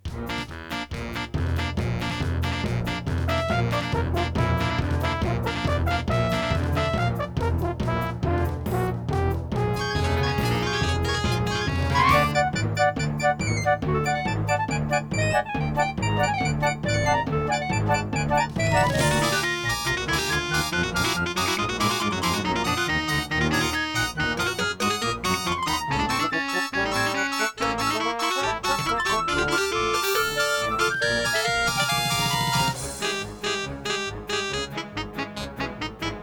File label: GUS PnP; Day of the Tentacle Opening